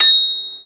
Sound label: ding, mechanical Braille writer; braillesim